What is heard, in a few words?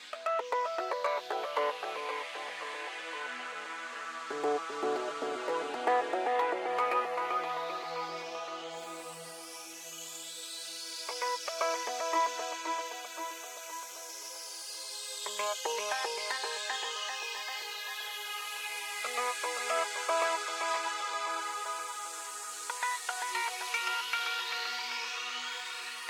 Mix Stem Layer; quantum recharged gamerip 2023